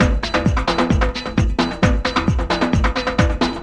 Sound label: TRIBAL, Techno Trance; E-MU Formula 4000 Series Vol. 2